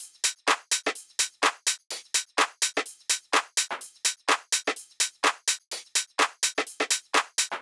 Drum Loop Top 126bpm

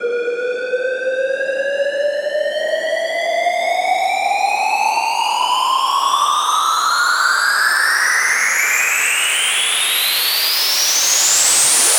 Riser 160 BPM 8 Bars

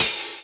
Open Hat

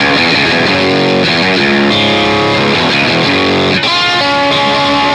Guitar Riff C m 93bpm rock groove

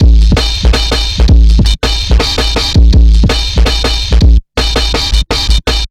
break

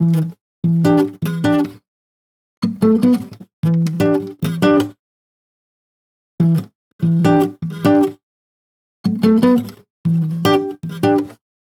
Guitar Loop G Major 75 BPM

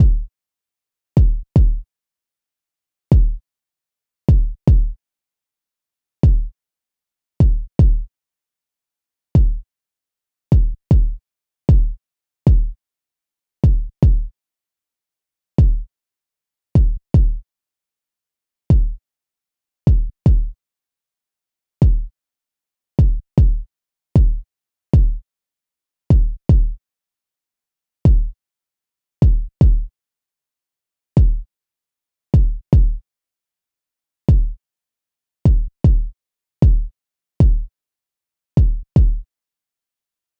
Kick

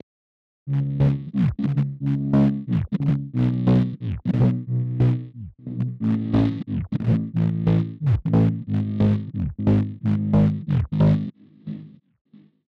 Bass Stutter, 90

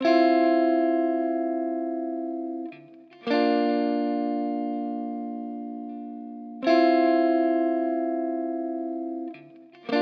Rhythm Guitar

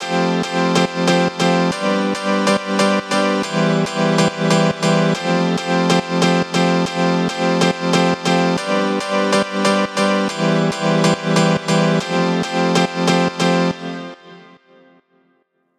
Piano, 140, F

